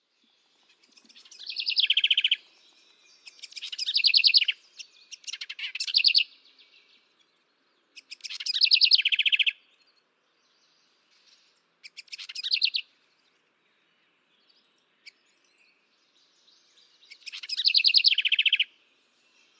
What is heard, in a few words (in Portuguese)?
canto da garrincha